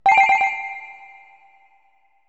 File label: Collect Pearl; donkey kong 64